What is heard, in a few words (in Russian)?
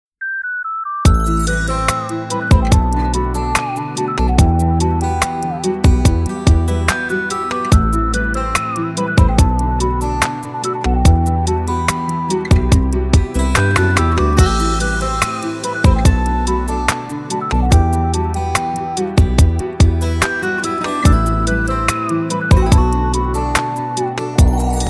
исправленная мелодия